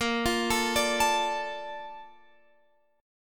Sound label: A sharp Major 7th, Guitar Open F; arpeggiated